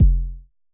Basic Kick F1, Dirty Kicks, Vermona Kick Lancet; LookIMadeAThing Kicks

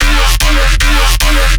bass loop 150 D#; doublemunch; Virtual Riot

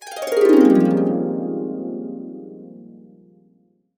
AB MAJ, Harps; USB Soundscan vol.62; Complete Harps; AKAI

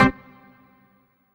HOUSE, House Dance

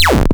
Dred Snare; Cwejman S1; DevSnd Cwejman S1 - JK

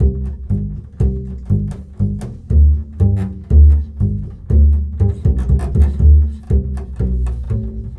Bull Fiddle, Appalachian

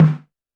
Snare, Roland TD-20

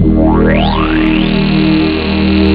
chord; robocop; ST-XX Amiga Soundtracker Sample Packs

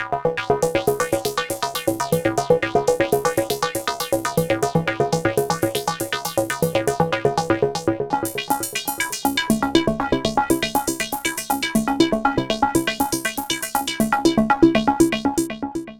Arpeggiation, Chillout; Sonic Foundry (Sony Creative Software); Afterhours EDM; Chillout Service

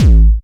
TEK KICK; Drums In Your FACE!

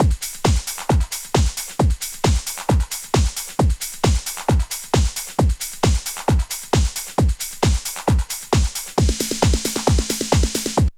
DRUM, 132; Megabass Remix! 2

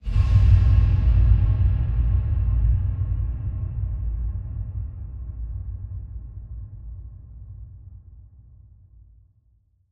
Huge Verb Tail, Long Reverb Tails; impact samples

